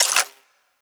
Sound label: Weapon Foley (Laser)